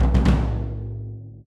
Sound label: drum; bannerman